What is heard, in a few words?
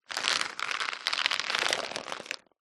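household medicine vitamin c tablets movements plastic tub; zapsplat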